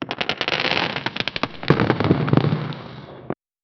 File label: a boulder crashes with